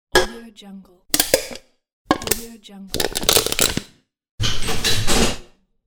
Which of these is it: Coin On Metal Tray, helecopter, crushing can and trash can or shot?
crushing can and trash can